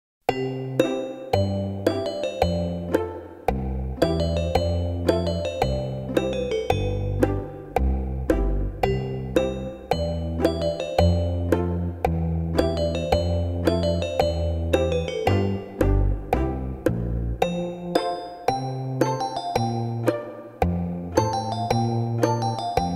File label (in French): musique ascenseur